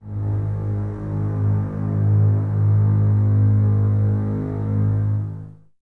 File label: BSS G#1, String Ensembles, Basses; Lo+Top; Miroslav Vitous; 90 s Sample CDs